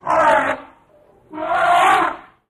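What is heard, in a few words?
Elephant Baby Trumpet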